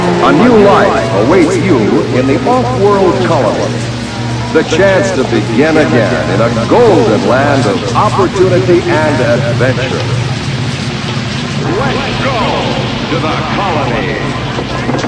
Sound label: blimp, vehicles; quake2